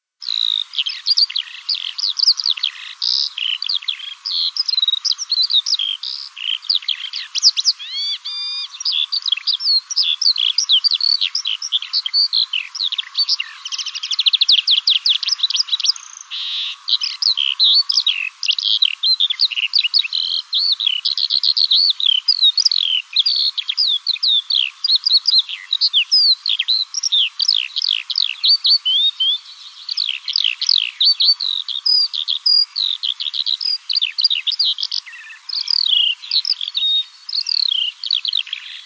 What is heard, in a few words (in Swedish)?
sånglärka; Körlinge